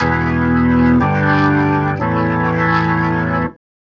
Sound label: Organ